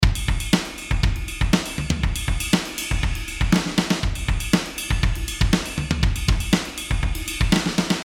rockdrum; Addictive Drums 2; Producer Pack; XLN Audio

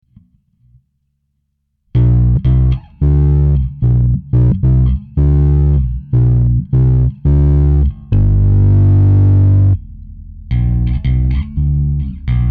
Bass Amp Room; Softube

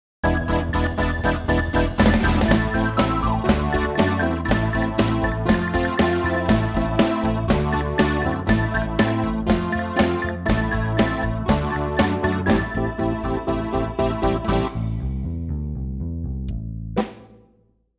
Hohner pianet n; pianoteq; Modartt-Pianoteq; Hohner Collection

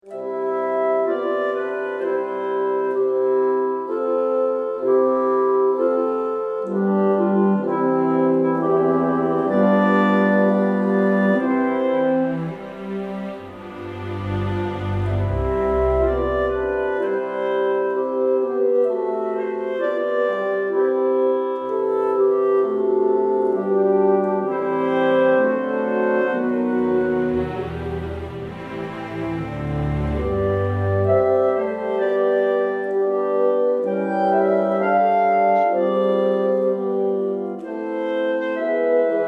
winds true legato; chris hein orchestral winds und brass compact

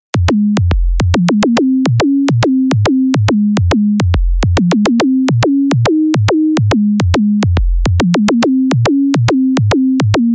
rawkick; rob papen; eXplorer Software Bundle

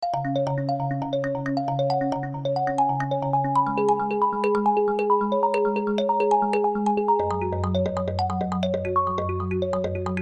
kalimba percussion; Titan 2; Synthesizer Library; Best Service

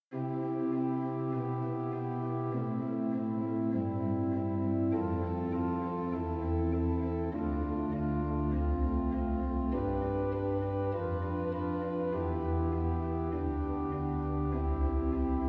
Pipe Organ; Toontrack; EZkeys Pipe Organ von Toontrack

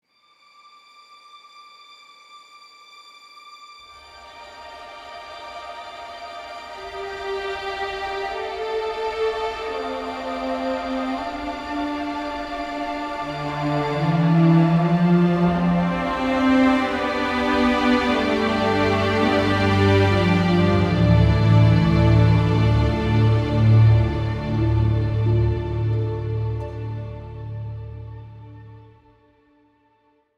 ORCHESTRAL STRINGS; Another Dimension; LUX ORCHESTRAL STRINGS; sonuscore

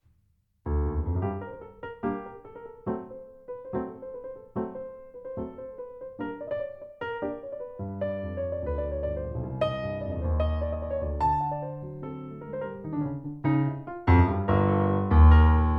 tango; mercury

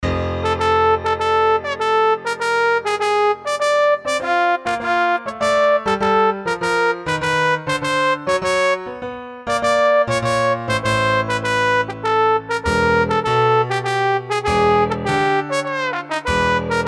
solo trombone; Solo Tenor Trombone

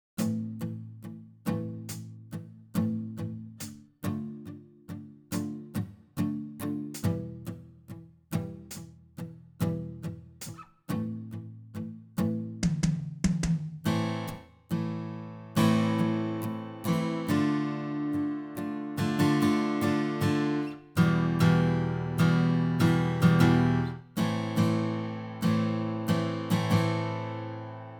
Chorus; Audified STA Effects Bundle

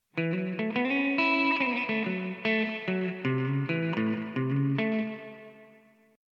les paul reverb; koenig blue note gitarren top; Harald König

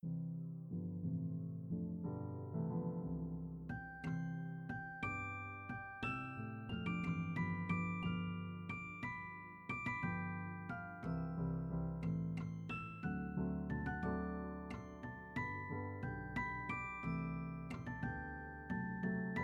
dulci piano; SD01 Dustbundle; Spitfire Audio

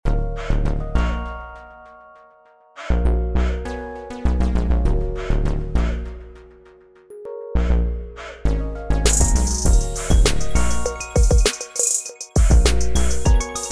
pop; MPC Beats Software von Akai Professional

